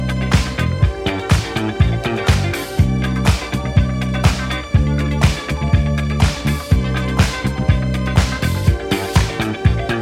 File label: Instrumental